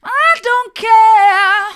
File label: i don't care mid, vocal